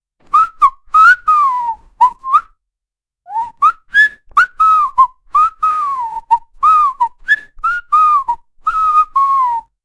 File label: rhythmic woman wheezing